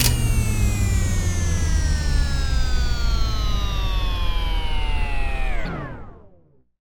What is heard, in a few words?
ultrabomb generator off; Original TK Sounds